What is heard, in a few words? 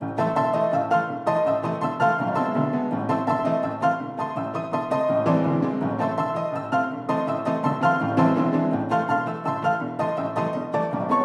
Western Piano; Postal 2 Paradise Lost